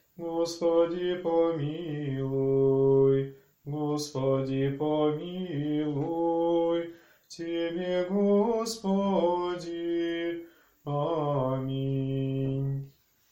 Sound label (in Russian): Малая ектиния византийский